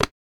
closing small wooden lid

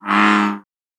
bullfrog croak

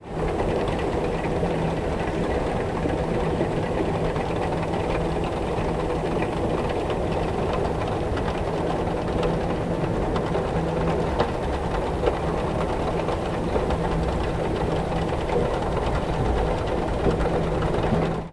escalator

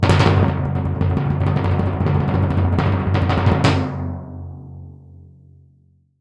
kettle drum roll

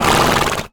horse snorting

